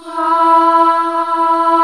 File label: boy choir E3; Boys Choir; MULTISAMPLED